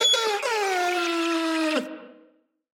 Whine

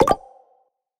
Application Button; HappyDays

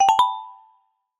Enter Meeting

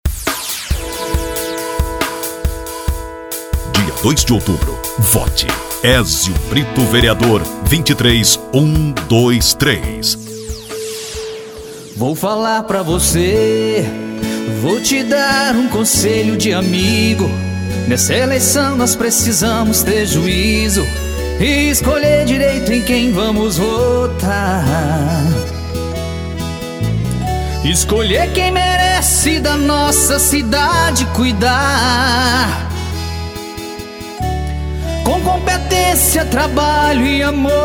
jingle; vereador; CAMAROTE

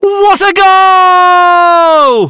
goal

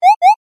alert jump

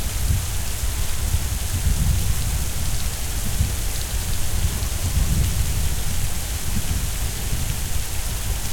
monsoon Environment; jumanji

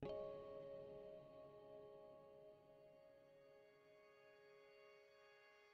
piano string

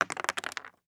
dice roll

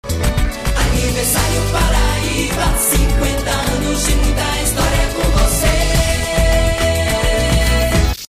Jingles

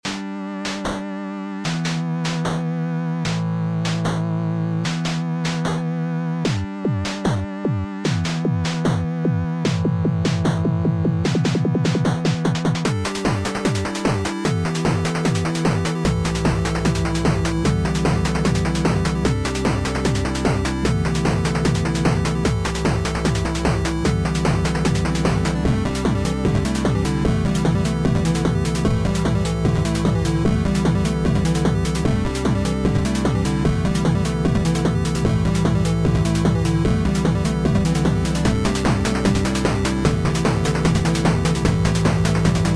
music match

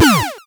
enemy shoot; sky knight

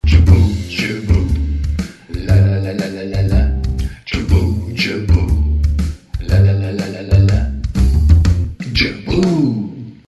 jingle; joboo

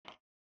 type; zara

